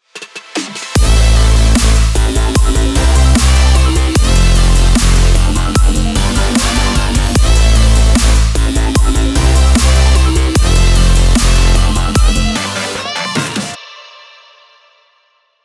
uplifting future bass

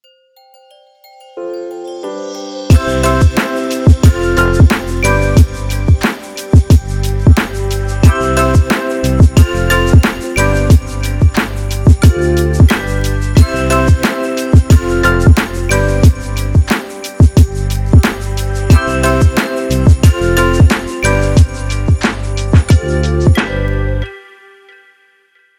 beautiful christmas

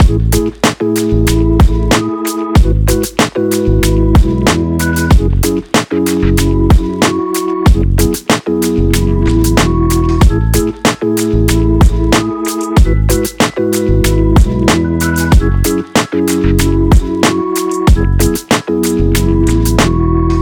easygoing background hip hop

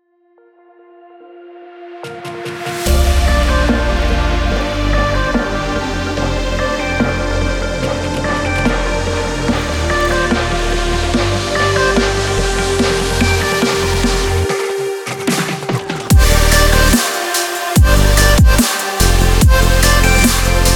extreme energy burst

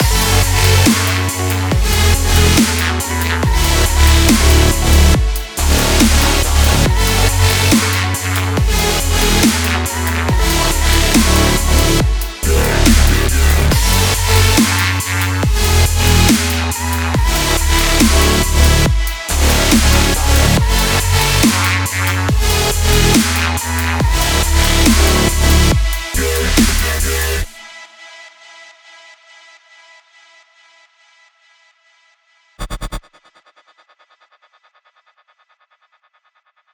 future dubstep

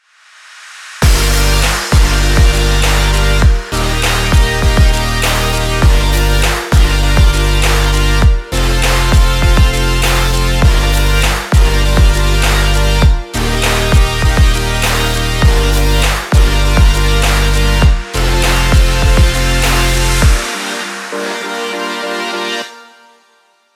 uplifting summer pop future bass

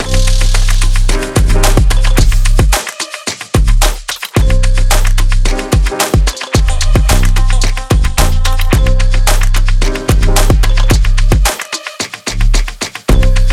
dynamic impulse loop

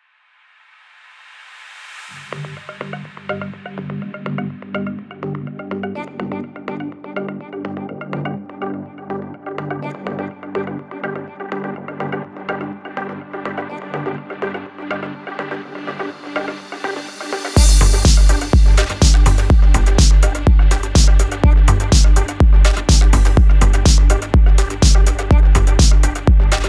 atmospheric tech

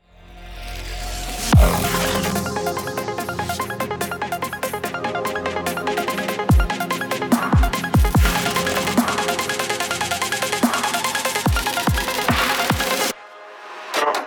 extreme sports

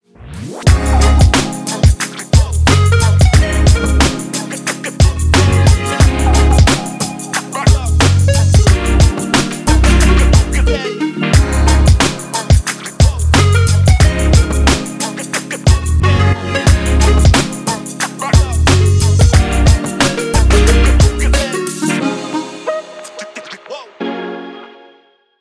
energetic hip hop uplift

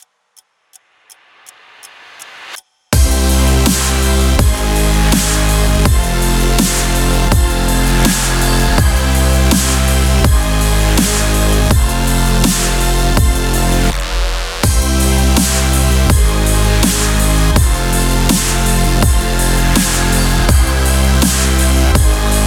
epic sport anthem dubstep

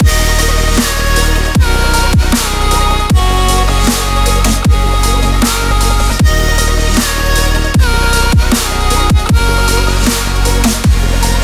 sport intensity loop

